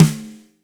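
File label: fat snare left; Spectrasonics; Burning Grooves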